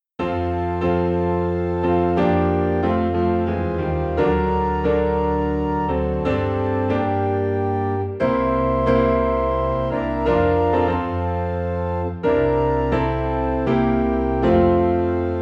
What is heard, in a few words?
Piano and Organ in F